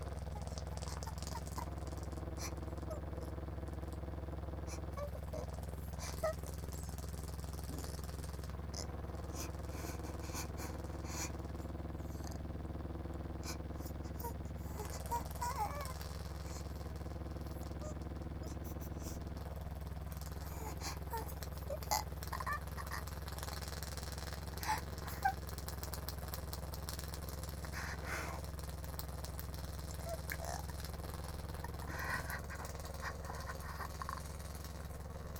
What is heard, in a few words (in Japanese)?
吸うやつ、スイッチ最強モード, ASMR